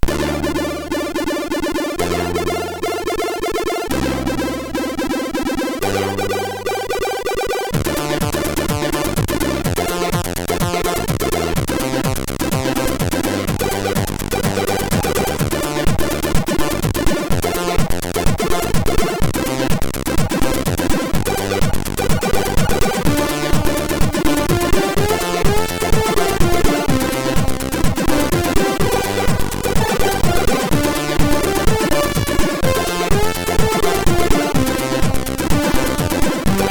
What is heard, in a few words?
remiKs, MOS6581R3; sid